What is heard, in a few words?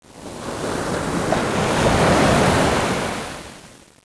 wave, ambience